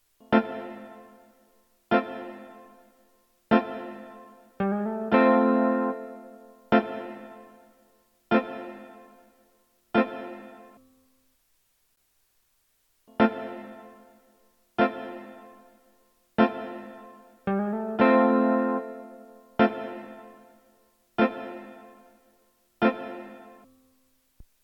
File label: Chord